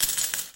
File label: Coins